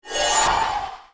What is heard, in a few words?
pick bean